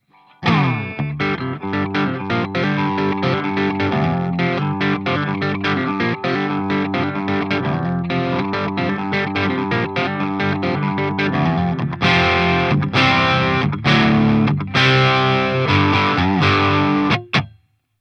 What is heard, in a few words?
FAME T120 CLEAN BOOST, guitars